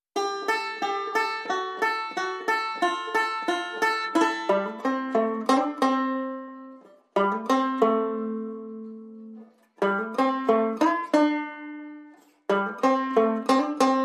Banjo Blues Whistle Banjo Harp

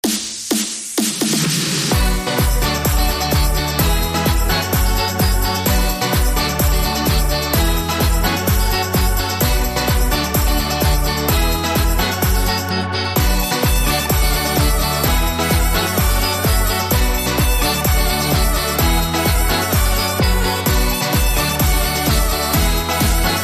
Electro Pop Diva

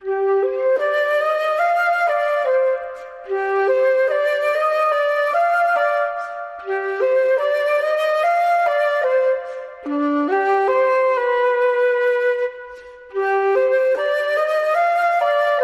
Flute

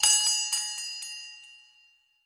Jingle Jingle Goodwill Bell

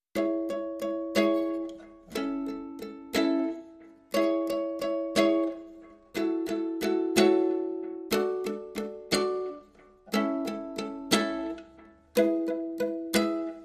Ukulele Electric guitar Acoustic guitar

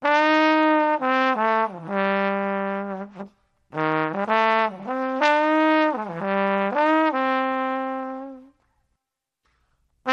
Trombone Sad Blues

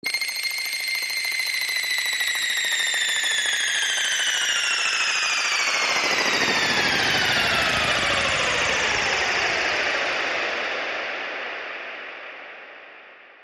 Stars Falling

